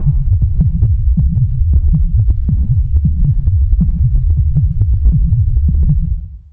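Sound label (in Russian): орган одиночный мелодия снаружи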